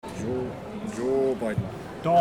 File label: crowd